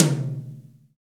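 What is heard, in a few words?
tom, acoustic toms; Roland - Rhythm Section; Real Toms 1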